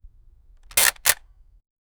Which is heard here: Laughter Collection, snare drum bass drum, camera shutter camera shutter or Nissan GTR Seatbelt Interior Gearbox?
camera shutter camera shutter